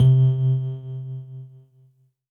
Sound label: Synth Prophet One Shots, Keys C; Electronisounds